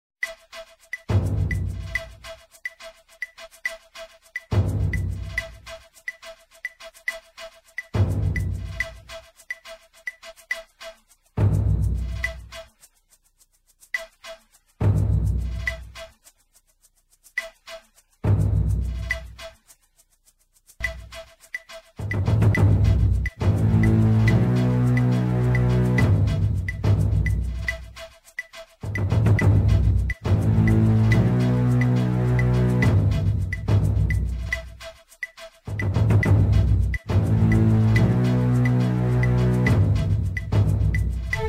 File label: Bomb Run; Conker's Bad Fur Day